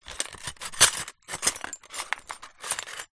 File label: glass shards broken picture frame movement; foley